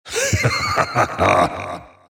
laugh; dota; juggernaut

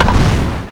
ignite cast; dota; ogre magi

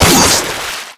watersword crit, weapons; dota; kunkka

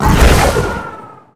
arctic attack; winter wyvern

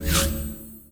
panorama cancel custom lobby; ui

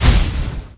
general attack; zuus

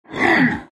shards pain; terrorblade

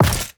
metalsmall drop; ui; inventory

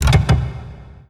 confirm socket, crafting; ui

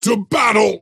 move pain; dota; axe

